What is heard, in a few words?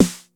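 909 snare drum, TR-909; Crypton - DrumBase5000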